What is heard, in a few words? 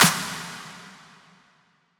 Clap; Donkey; Cymatics; Drum One Shots